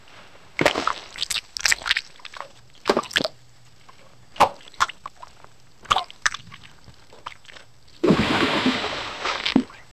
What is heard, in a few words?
cartoonish eating sounds, fries